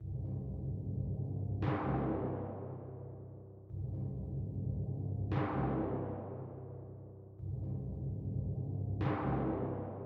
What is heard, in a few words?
Orchestra TImpani; McRoMusic; Summoning Circle